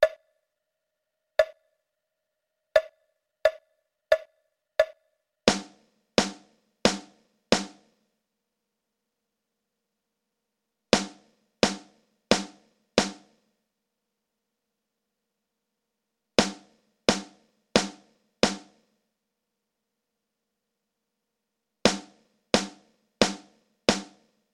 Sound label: Drum Recordings; Time For Band; Standards of Excellence; drums